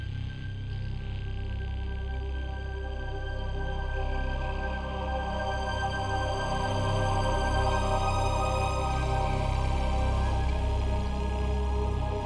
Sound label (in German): Geheimnisvoll Loop